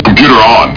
computer

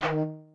chiff eb3; Alpha Centauri

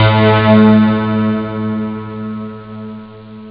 harpsi; OctaMED SoundStudio V1

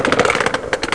grocery cart

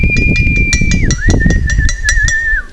cheer; spellit 1.0

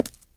FS Stone; kult

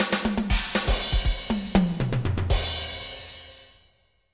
fill

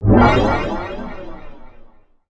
stealth flash

